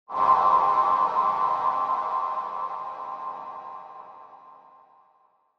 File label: Horror Accent, Low